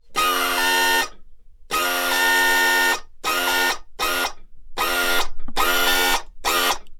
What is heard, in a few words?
hydraulic